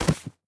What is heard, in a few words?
Pickup Book; The Town